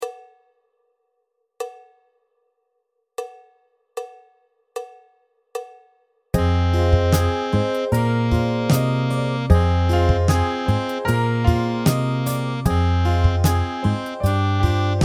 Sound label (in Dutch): Snel